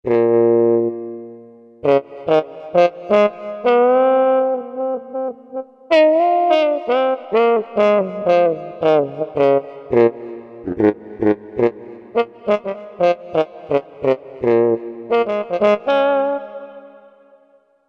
Plunger TBN, Korg MSC-11S Brass

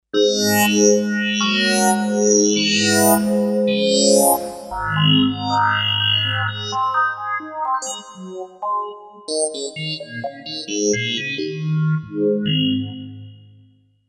Kawai K5000S

